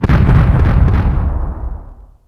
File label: Volcano Erupting; Wii